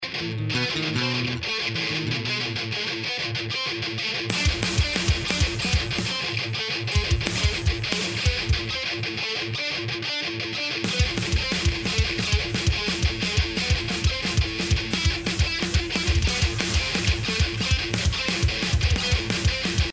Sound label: main riff